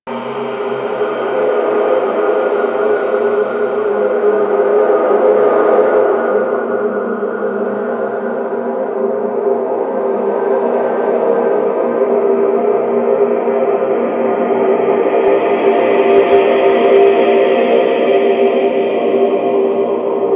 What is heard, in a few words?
Hub Amb, Ambience, Interior; Warframe; Tenno Hub